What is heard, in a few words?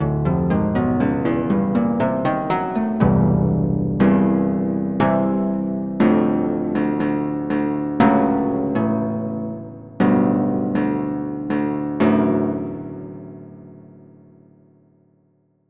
Harp Octs